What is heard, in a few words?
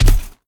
footstep; Smite; Tiamat